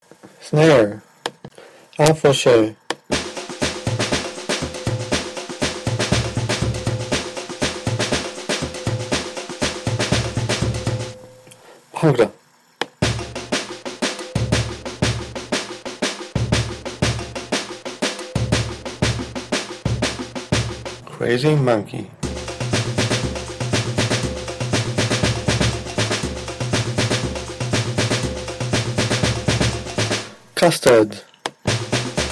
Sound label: Snare